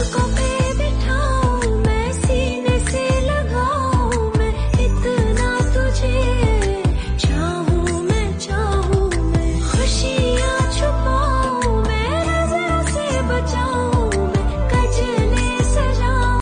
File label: Ringtone